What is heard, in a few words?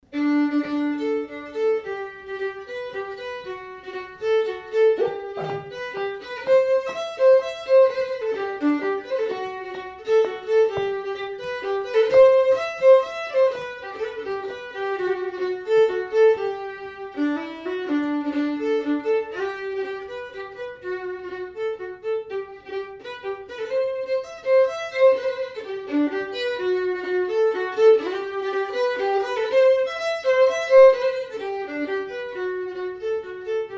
landler, fiddle